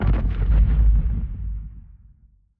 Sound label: backfire; crow; mp intro; Titanfall 2